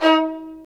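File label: Marcato, Strings; Vlas Marcato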